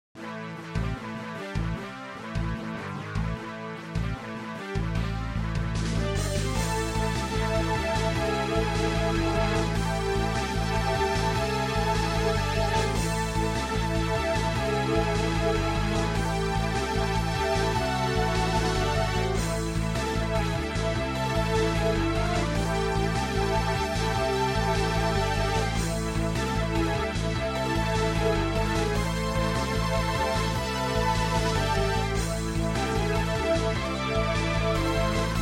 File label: MIDI Battle